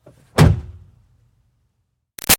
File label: Car Highlander Door Close Slam; 2005 Toyota Highlander V6; Cars; Vehicles; Big Room Sound